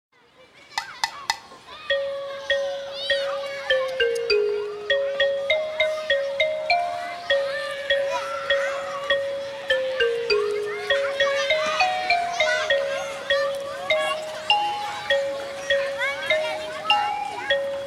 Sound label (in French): VERSION KARAOKE; Alex et Zoé 1